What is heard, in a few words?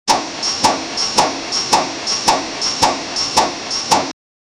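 rickshaw brakes and stops, brake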